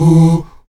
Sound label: VOX AHOO, Afro Chants, Stacc Ah Oos